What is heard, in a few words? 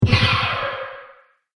Scifi Weapon Shot, laser gun